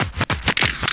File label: Scratchy, FX; Vengeance Samples